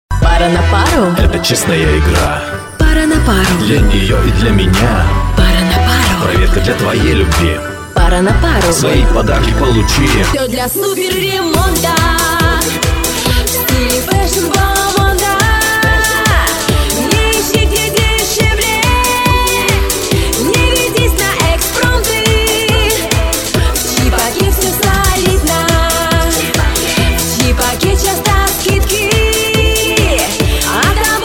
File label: vokal